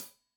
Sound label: Hat Tight, Hi-Hat; Deeply Sampled Drums